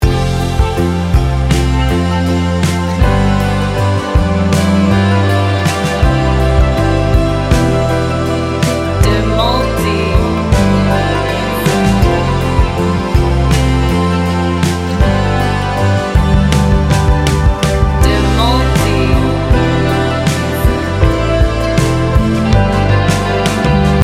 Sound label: instrumental ballad; Kadang kesendirian tidak selalu berarti sepi. Lagu ini membungkus momen sendiri dengan keindahan